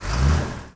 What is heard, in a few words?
Vehicle Jeep Revving; Playable Tutorial Level